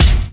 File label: Airy Bass Drum One Shot C Key, Royality free kick drum single shot tuned to the C note.